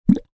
household baby lotion glug from bottle

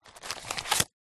purse pouch leather note pull out; foley

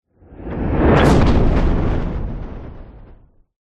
sound design whoosh fire like airy

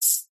insect chirp vocalisation; animals

